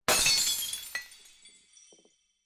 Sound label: Glass